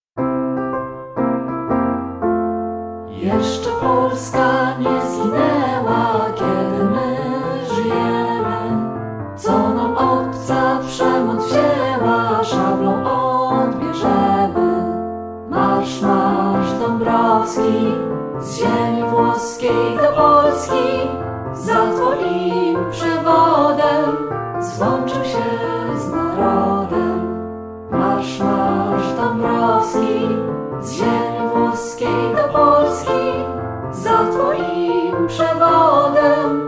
mazurek dabrowskiego; Nagrania Lokomotywy

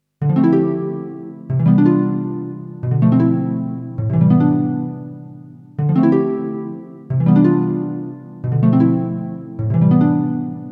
harfa; Nagrania Lokomotywy

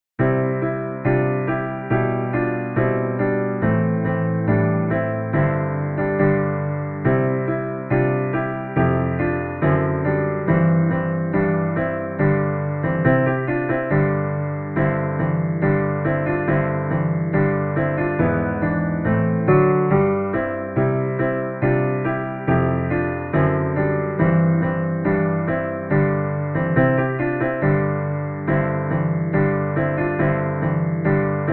hymn unii europejskiej; Nagrania Lokomotywy

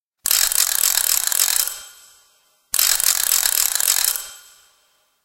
terkotka; Nagrania Lokomotywy; edukacja wczesnoszkolna

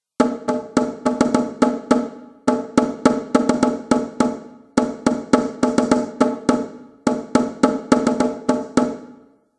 werbel; Nagrania Lokomotywy; edukacja wczesnoszkolna

